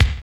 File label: KICK DULL, Ambient Kick; Roland L-CD701; Ambient K1